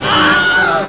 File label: scream